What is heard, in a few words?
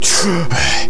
gasp